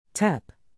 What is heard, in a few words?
tap